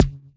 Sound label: Percussive FX; Vengeance Samples; VEH1 Percussion